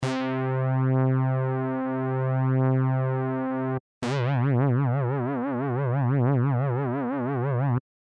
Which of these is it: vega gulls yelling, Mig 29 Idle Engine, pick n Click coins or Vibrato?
Vibrato